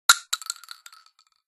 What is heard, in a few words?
spread casing; spreadgun